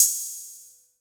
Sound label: CYMBAL, Hip Hop Misc Perc; E-MU Producer Series Vol. 8 – Platinum Phatt